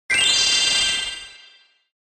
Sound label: gem sound reverb; spyro